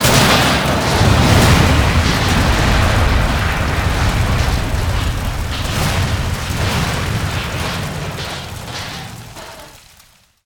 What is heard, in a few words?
Explo Large Metal; Destruction